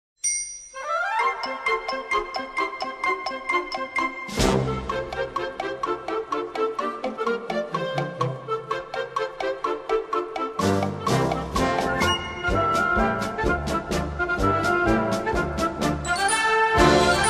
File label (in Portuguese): hino a musica instrumental